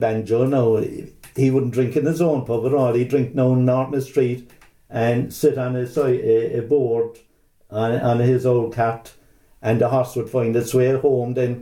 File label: Banjos Pub